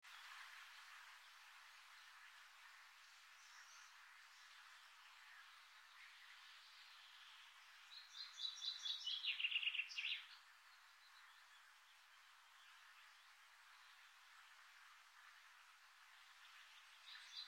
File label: morning birds; طولانی و آرام بخش